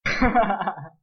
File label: haha, risada